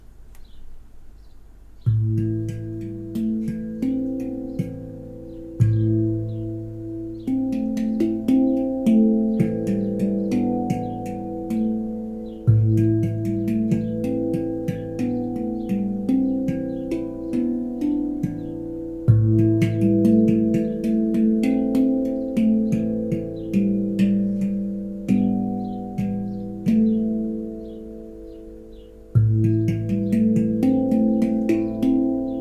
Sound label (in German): handpan celtic a moll; Hörbeispiel mit einer Handpan